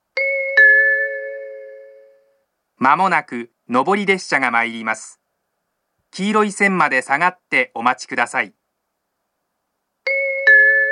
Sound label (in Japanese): uguisu; 上り接近放送; 秋田県大仙市; 田沢湖線 角館・田沢湖・盛岡方面 大曲方面